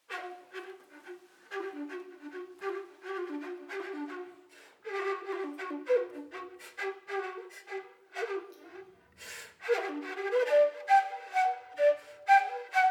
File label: rock sample; silistra